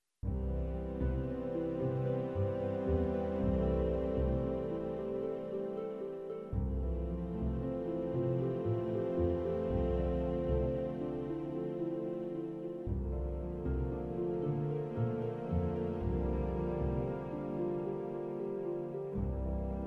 melody groups violins winds backing dry

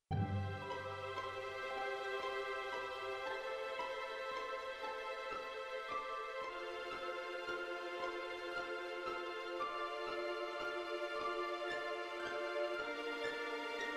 melody ww backing wet

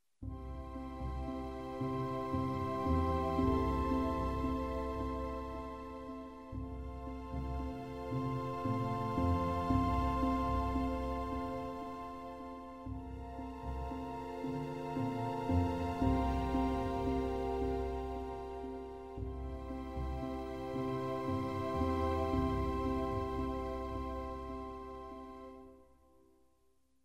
melody groups violas cellos winds backing wet

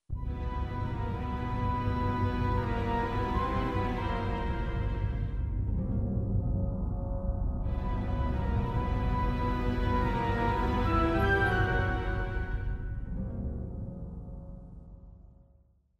melody 3/4 octave backing

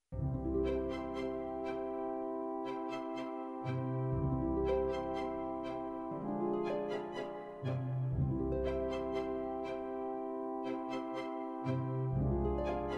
melody backing wet

